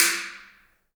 HAIRCUTS, KENYA DRM; Spectrasonics

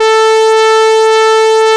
LED, S-LEAD; AKAI S-Series CD-ROM Sound Library VOL-8

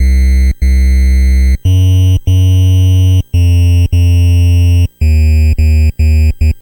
NES Bass, chiptune

